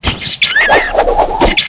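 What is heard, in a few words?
Npc Fly bat Attack; FlyForInsanity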